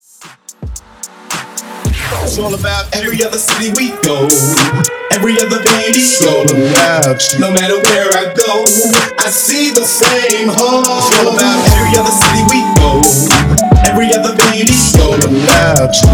Hype In Outro Rework 110 BPM

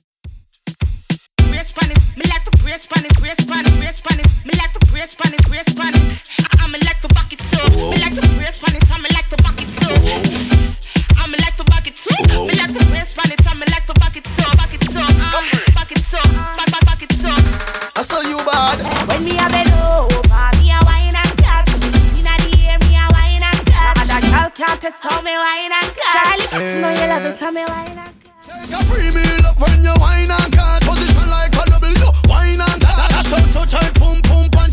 Moombahton Redrum 105bpm

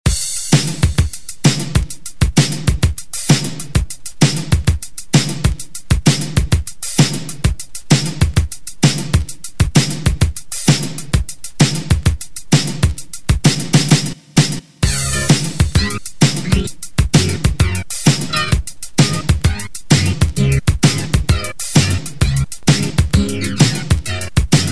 Hit Rock Redrum; Deejay Hit

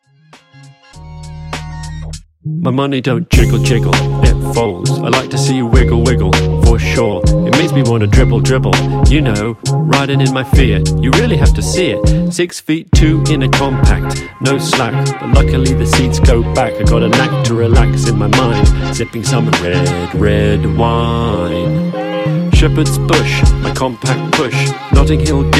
Dj Intro Outro 100Bpm

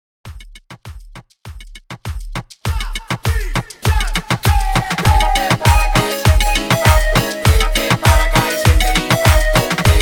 100 BPM; MASHUP

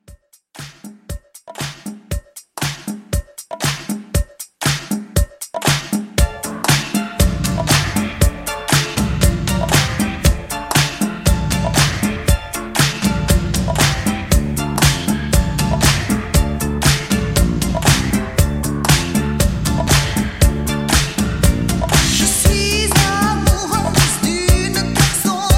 80s Pop Re Drum 118

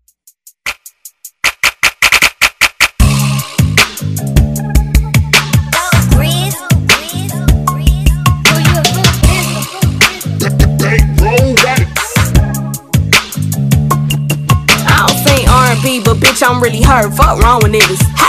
Hiphop Redrum

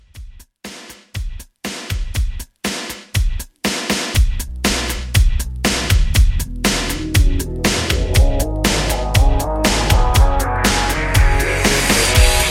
Rock 120

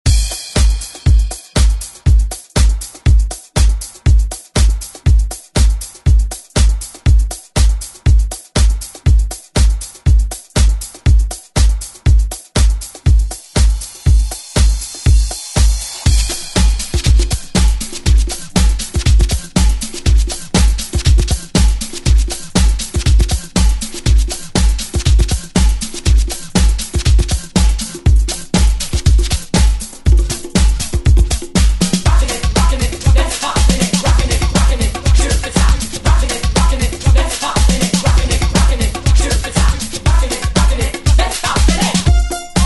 80s ReDrum; DJ Mhark

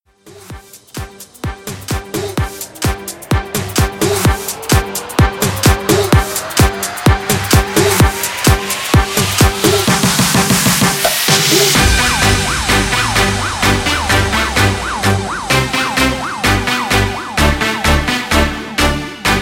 Christmas Bounce Christmas Bangerz Intro; Remixfreakz

